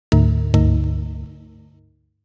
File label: knock double